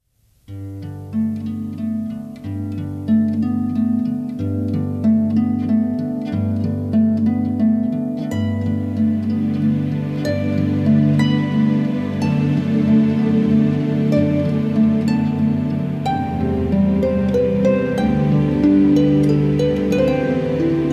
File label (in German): Harfen